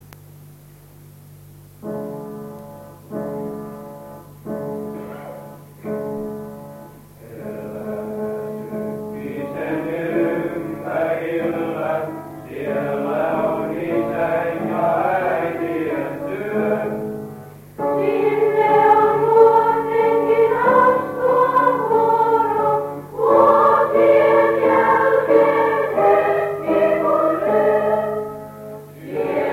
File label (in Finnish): juhlakantaatti kotiseutu